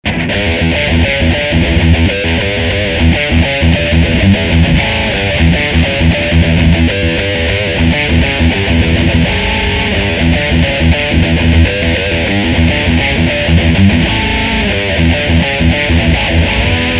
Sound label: EVIL